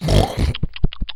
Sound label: Enemy Eat